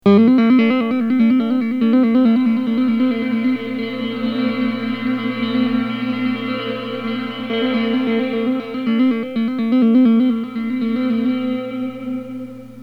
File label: mix control, amp; moonlight; moonverb